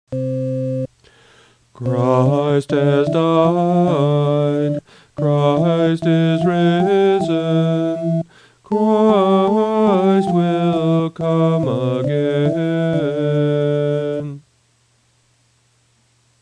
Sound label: Mass XV Acclamation English, chant; Mass Parts